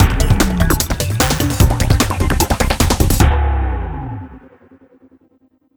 Stab; Buzz! Junior Robojam; PS2

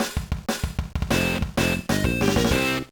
Jingle, gba

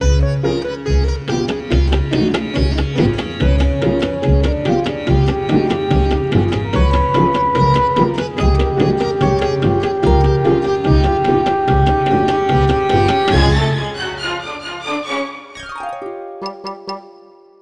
Tuba

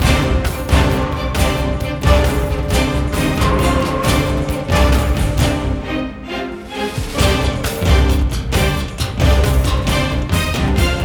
River Battle Orch; Crysis

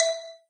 Combo Sound; candy crush saga gamerip

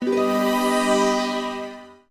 Jingle Change

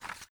Paper Drag stop; papers please; android

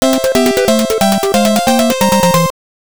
Floor Clear; Babel no Tou; x68000

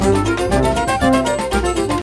mus cage forcefield; Rayman Origins; jungle world

